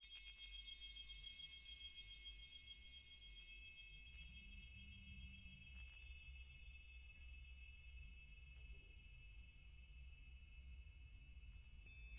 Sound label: Encens ambient